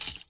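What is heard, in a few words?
Inspect Shell Movement; Valorant; odin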